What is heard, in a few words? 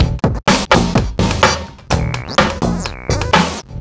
Tech Funk, Synchro Funk, Beats; Sonic Foundry (Sony Creative Software)